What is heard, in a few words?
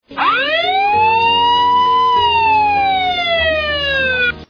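fire alarm sound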